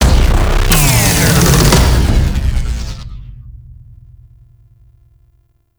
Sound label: Defeated Stinger; Warframe; Captain Vor; Abilities Redux